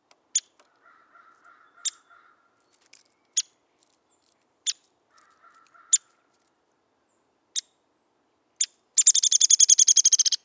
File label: Downy Woodpecker